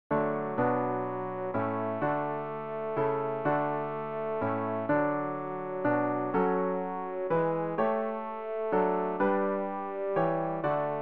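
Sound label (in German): tenor, Übehilfen; Ref. Gesangbuch; RG 057: Nun jauchzt dem Herren, alle Welt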